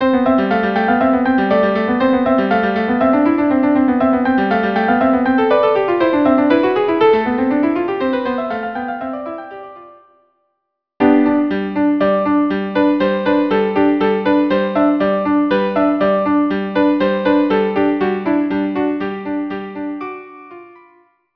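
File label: violin duo; Dance of Jesters Rims Kors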